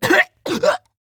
Coughing; Pacifist; Warframe; Evacuation

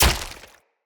Husk Impact Flesh, Unarmed; Weapon Impacts; Enemy; Mockery Husk

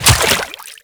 Step Water, Footsteps; Warframe; The New War; Characters; Kahl

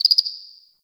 Cricket; Ambience; Props; Warframe; The New War; Zariman

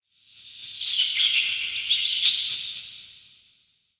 Ghost Rat Chatter; Ambience; Soul Plane; Witch Station Ruins

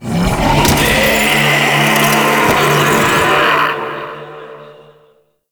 Amalgam Override Drain Ability; Warframe

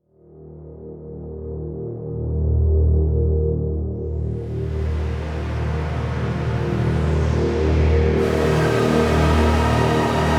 Ambient Random; Railjack; Ambient One Offs

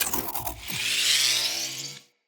Cannon Equip Charge; Weapons; Player

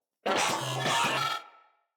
Moa Vocal Enemy Spotted

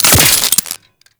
Head Shot Metal, Bullet Impacts; Warframe

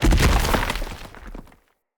Stag Gallop Charge Vegetation, Footsteps; Animals; Foley

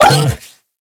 Feral Vocal Pain; Warframe; Kubrow

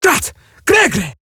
surprised, female; en; Eximus Grineer; Battle Barks

